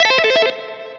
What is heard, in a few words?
tap; Sony Ericsson; PlusFourSix